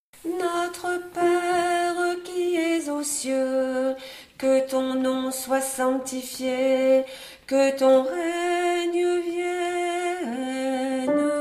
Notre pere - B, Basse; Notre père de Rimsky Korsakof

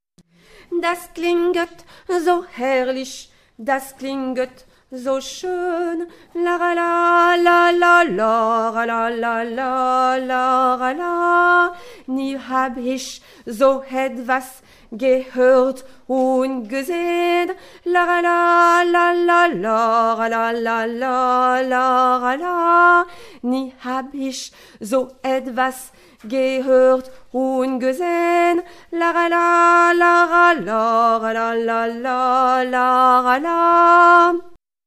Choeur des clochettes, Basse

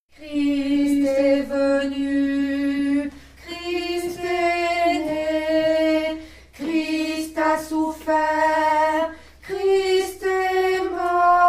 A, Alto; Christ est venu

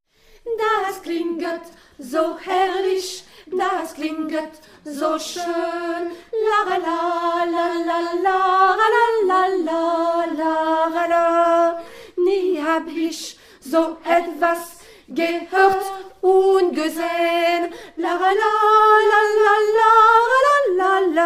Choeur des clochettes, Alto

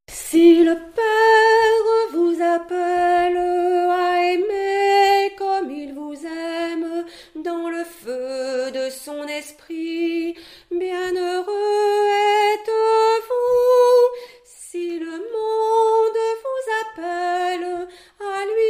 Mariage Si le pere, alto

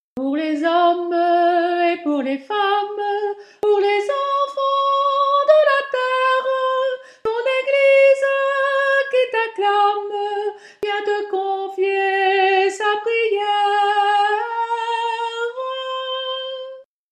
Pour les hommes et pour les femmes S, Soprano

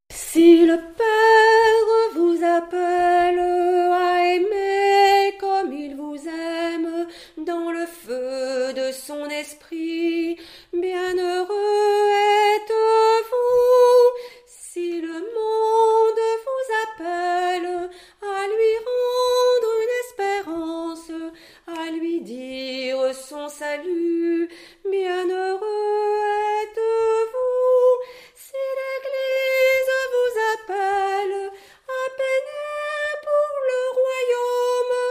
Mariage Si le pere, soprano

